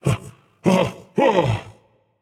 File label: nv fear; smite; voicepack; ganesha